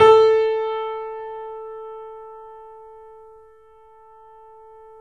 Small Pianos, 3-D Audio Collection

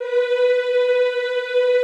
EEH, Classical Choir; Best Service; Extended Classical Choir

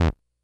Bleep B, Drum Machines, Korg DS-10

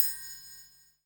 PERC, Dance Mega Drums; Best Service; PERC-MIX

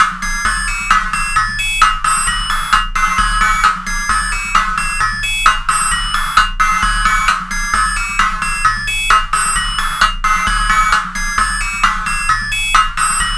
Sine Wave, Processed Percussion

